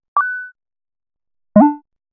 modified coins

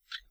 tick

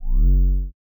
shields up

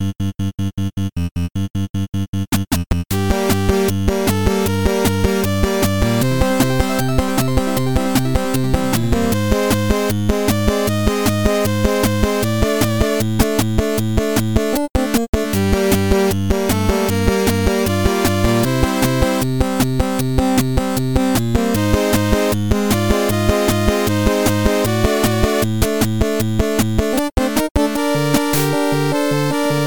battle

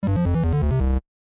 8Bit Action Power down; Bogart VGM; 8Bit Action Soundtrack

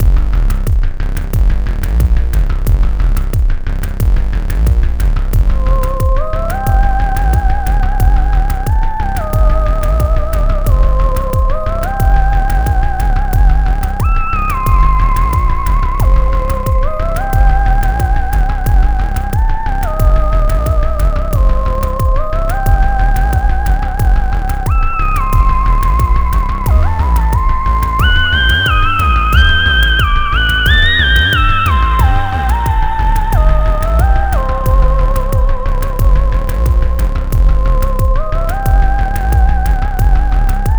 ghost trap

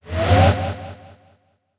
Powerup; Electricity Game Sound Pack

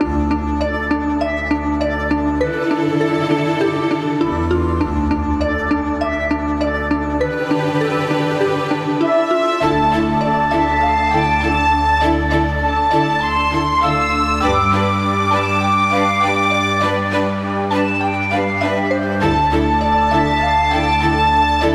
magic puzzle title bpm100

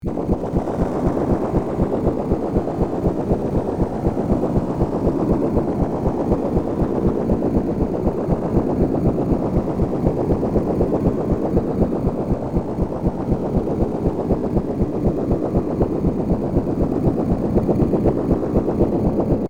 space ship floating sound

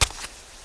sfx step rock

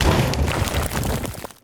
cannon hit wall no splash

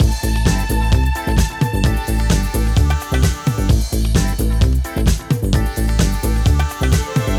intro enter

